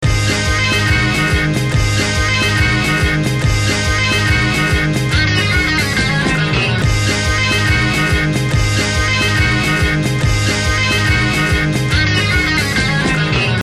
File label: solo